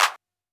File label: Clap, drumkits 707